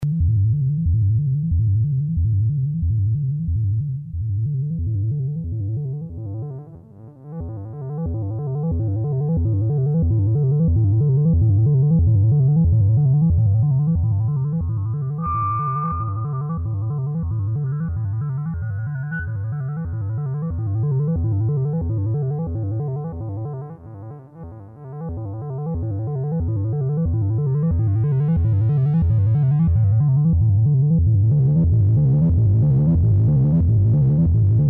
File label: MINIMOOG on the run